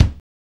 KICKS; Zero-G; Funky Elements

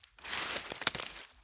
Trudge Step, outdoor, Footsteps

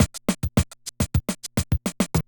Loop, classic machines, rpm 40

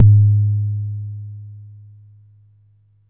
Long BD, Jomox Xbase09

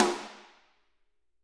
SD, Drums; Bob Clearmountain Drums I

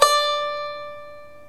CHINESE KOTO; OMI - Universe of Sounds

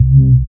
SYN 202, MC-202; Sound & Vision - Gigapack I CD 2

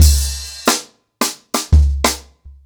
Ripple Effect 90BPM, acoustic drums

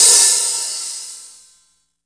HH, Drum Dry; East Collexion; Drum 1 Dry

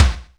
drum d#4, DRUMCOMPUTER YAMAHA R5+RX7; Best Service ProSamples vol.55; Retro Sampler

